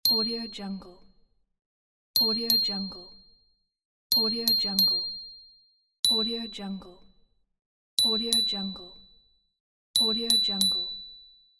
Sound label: bell ding